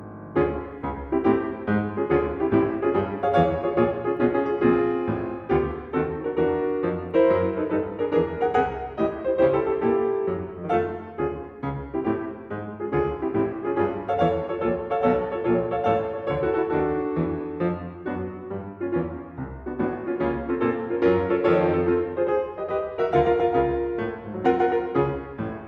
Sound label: Sonatina For pianoforte, Marcia Con Brio, Un Poco Burlescamente; Tranchell